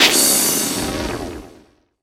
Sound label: NOISE, Production Effects